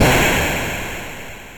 explosion

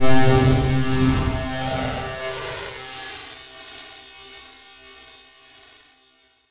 Elec Filt Warp; BattleCats

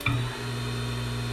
turret full; BattleCats

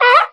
seal; AntiTD

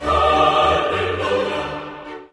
holy grenade

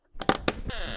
open chest; voxelands

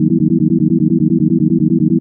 aliens; lightyears

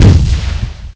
Mortar; freeciv

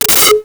notify; emacs

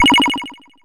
gravity change

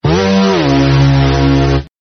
whammy; LameXP

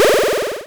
swap bad, laser; kokogems

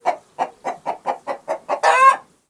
chicken; LameXP

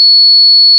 beep; LameXP

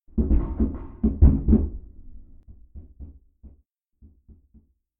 Bang; EroSomnia; Point and Click Game; BGMSFX

